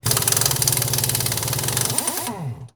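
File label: Impact small, air tools, industrial; Air toolks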